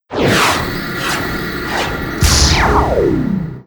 stone hand; Fly For Insanity

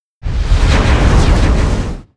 Sonic hand; Fly For Insanity

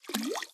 fishmould fill; Candy Crush Friends

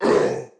hurt; FlyForInsanity

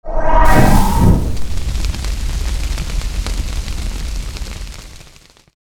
Fire stk; Fly For Insanity

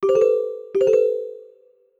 ringtone; Microsoft Office; Lync

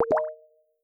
video added; Microsoft Office; LYNC

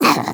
Pukepuke Dmg; FlyForInsanity

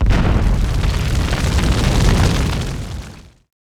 Flame Arrow; Pc Skill; FlyForInsanity

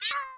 death; FlyForInsanity

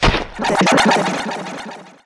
Skill Silent Arrow; FlyForInsanity

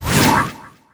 SKILL CRACKS BOW POWER INCREASE; FlyForInsanity

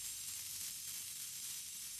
bomb fuse sfx; Candy Crush Friends

